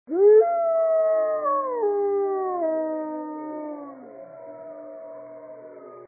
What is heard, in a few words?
Single howl, wolves